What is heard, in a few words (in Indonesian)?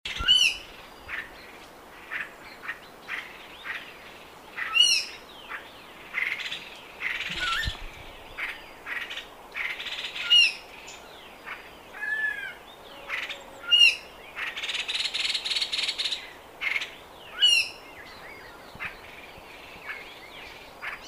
samho call